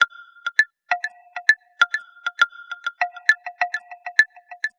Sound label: Driftwood Marimba, Acoustic Beats; Sonic Foundry (Sony Creative Software); Myths of Technology